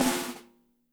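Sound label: piccolo snare; Spectrasonics; Burning Grooves CD2; PICC. BALLAD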